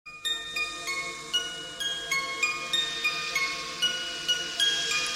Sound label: Cute Soft Tone